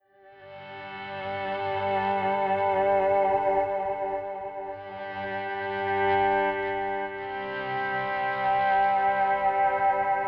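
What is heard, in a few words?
Country Guitar, Chorus 1; Digidesign; Pro Tools Essential Loops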